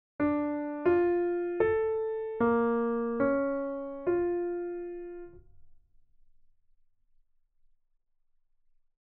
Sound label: arpeggios